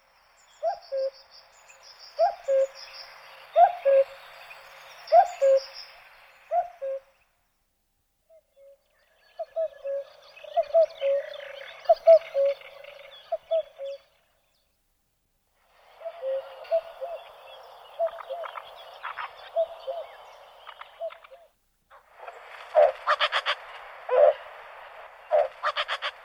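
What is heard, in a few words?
obyknovennaya kukushka golos, common cuckoo voice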